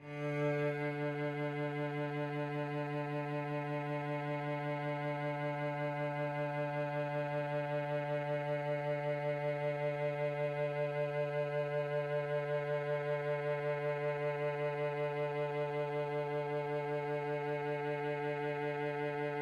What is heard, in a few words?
Cello D